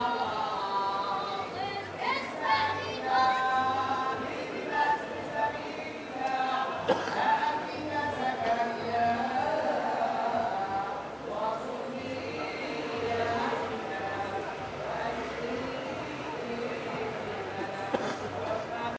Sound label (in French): chant enfant